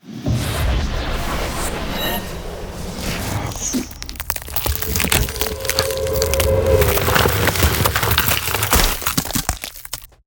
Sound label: Ice Spell Freezing Slowing Frozen Ground; Arctic Aura